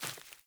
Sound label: Sandals Run Vegetation, Footsteps; Characters; Player